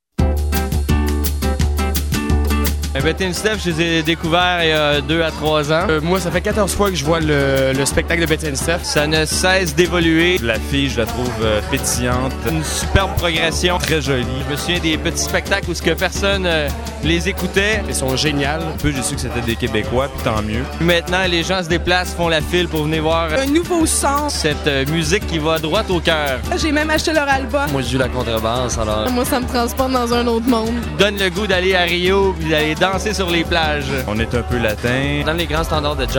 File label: bossa